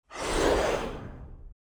Dragon Short Huff; Varazuvi; Hollywood Dragons

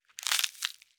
Crushes Leek

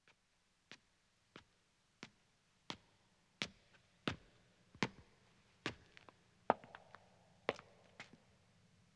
Stone Stairs (Echo) Ascending, Footsteps; BBC Sound Effects Library; Footsteps II

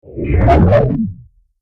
time start; FXHome

